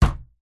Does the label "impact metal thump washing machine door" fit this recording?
Yes